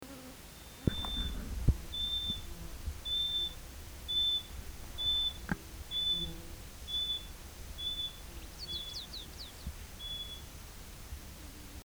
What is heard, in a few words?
Nothura minor